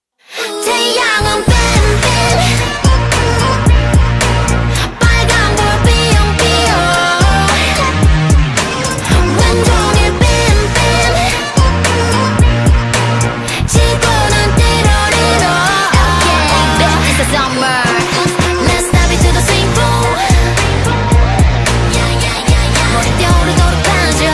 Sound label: BEAM BEAM